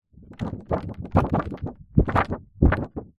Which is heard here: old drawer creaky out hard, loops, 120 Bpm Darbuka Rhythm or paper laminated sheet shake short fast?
paper laminated sheet shake short fast